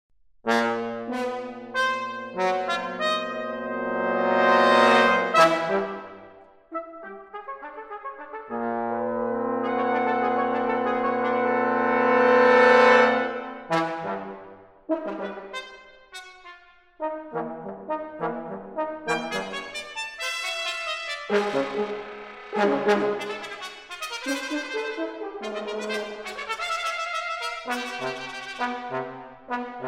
Brass Quintet, Scherzo

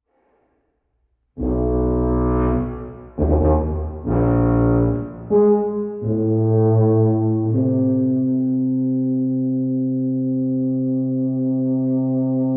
Sonata For Tuba And Piano Dialogue

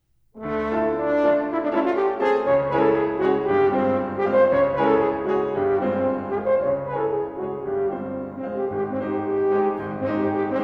Sonata for Horn and Piano, Allegro

